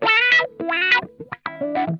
RUDE WAH, Funk Guitar; 90 s Sample CDs; Zero G

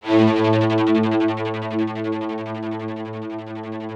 ROUGH STRNGS, Yamaha VP-1; Music Store

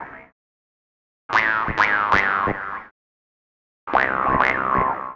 Wah Synth; Sonic Foundry (Sony Creative Software) - Stylus Pressure Urban Grooves on Digital Wax; Alien Tribe

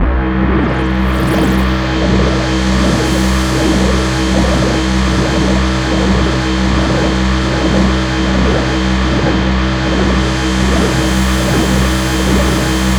C2, Synth Line; 90 s Sample CDs; NiceBeats; SynthLine VOL-16

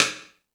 Ambient Rock, E-MU Pro-Cussion

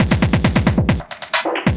LOOP, Drum & Bass, 135 BPM; Ueberschall; Drum & Bass Flash Trackz

